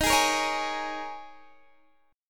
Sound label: D sharp 7th Suspended 4th, Guitar, Open D